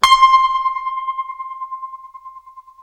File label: TREM C 5, Guitar, TREMELO; East West; Steve Stevens Guitar Samples Collection